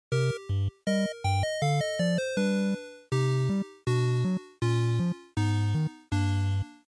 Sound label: NES General Game Over2